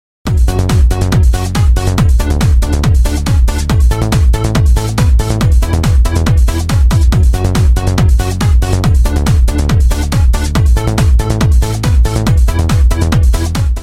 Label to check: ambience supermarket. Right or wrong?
Wrong